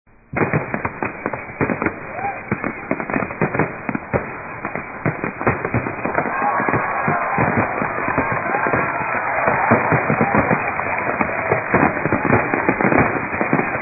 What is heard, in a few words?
Fireworks; At the sound of the beep